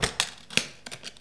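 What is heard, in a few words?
BOX CLOSED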